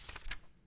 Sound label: book page flip; UI; ProjectEleri